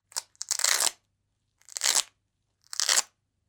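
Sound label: velcro sound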